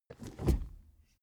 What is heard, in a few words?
suv push forward gear straight soft damped